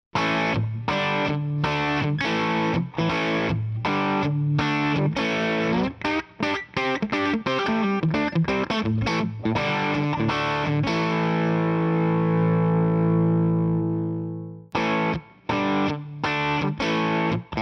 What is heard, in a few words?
Tele On 8